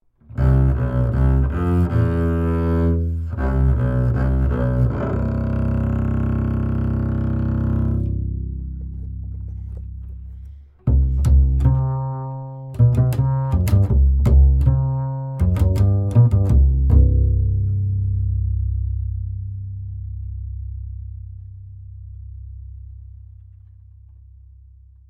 upright bass lct940 tube; lewitt

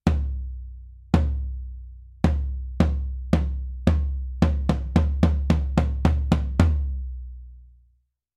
floor tom; lewitt

